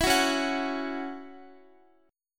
Db Minor Double Flat 5th Db Guitar; strummed; Dbmbb5; JGuitar

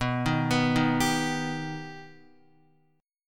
B Augmented B down Guitar; arpeggiated; JGuitar

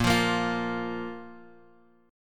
A sharp 5th A sharp; A#5 Chord {X 1 3 3 X 1} strummed

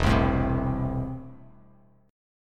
E Suspended 4th Sharp 5th, Bass Standard; strummed; JGuitar

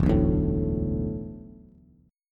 E 7th Suspended 4th, Bass; E7sus4 strummed; 0,2,0,2; JGuitar

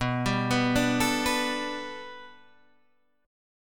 B Minor 7th Sharp 5th B, chord

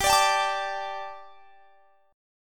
G Suspended 2nd; Gsus2; strummed

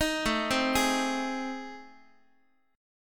A Minor Major 7th Flat 5th, Guitar; arpeggiated; AmM7b5; x,x,6,7,4,7; JGuitar

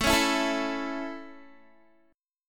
A Major, Guitar; strummed; 17,16,14,14,16,x

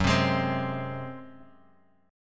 Gb Suspended 2nd Flat 5th, Guitar, Drop D; 4,3,6,5,x,x; down; chord shape

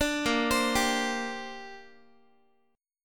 A sharp 6th Add 9th, Guitar; A#6add9 arpeggiated

